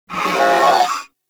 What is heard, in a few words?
Purgatory Warrior Charge Scream; Warframe